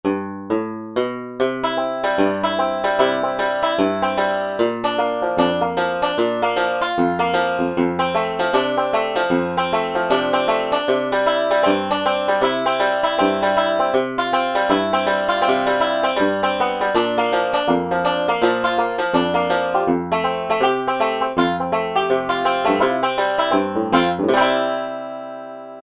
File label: Banjo, Roland SR-JV80-01 Pop